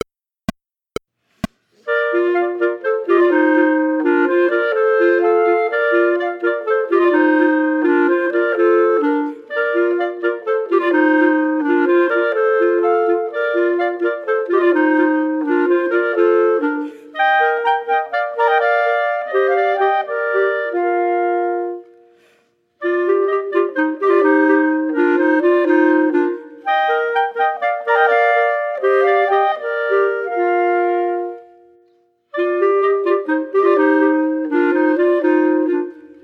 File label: Bohemian Polka minus 4th part; Ensemble Classics Book 1